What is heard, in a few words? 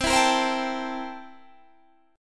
C 13th C down Guitar Standard; C13 strummed